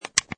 Switch small, Servo Panel Sound; Buttons; Multimedia; Lynne Music

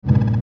Mouse Over; Digital Hum Warble; Multimedia; Blastwave

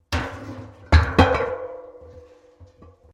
Pipes Metal Impact Tyre; Materials

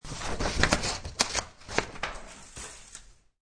Quick Paper Cut, Quick scissor cut.; Classroom; Schools

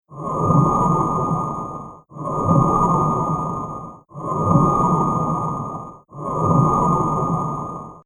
Alien Life Support Chamber Loop

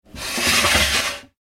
household door glass cupboard slide; Glass Cupboard Sliding Door ( Small ) Opened Or Closed; Kitchen; McKinney Sound

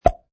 Cartoon Pop

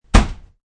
Shut ice machine.; SFXsource

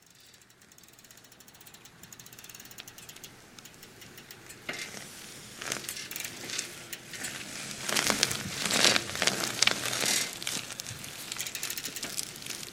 Bicycle Retro Cruiser By Crawl Wobbly; Slow Crawl; Pedaling; Bicycles; Vehicles; Big Room Sound

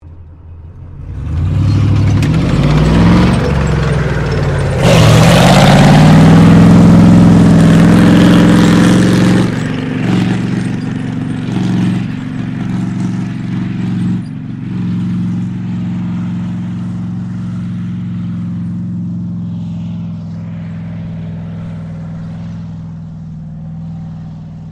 TANK M4 SHERMAN ACCEL, American M4 Sherman Tank; Trucks (Sound Effects:Vehicles)

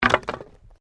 Wood Movement Minor

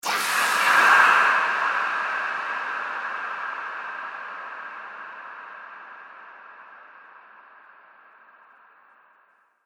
Scream Vampire, Monster Sound Effects; horror

